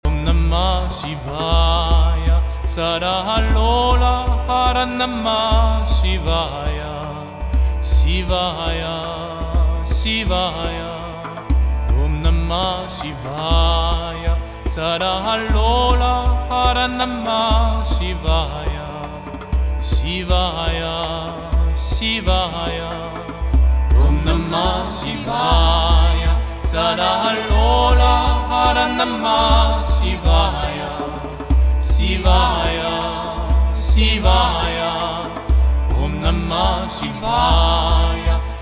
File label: Sada Lola Hara Namah Shivaha